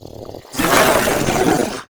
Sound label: imp sight, monsters; Doom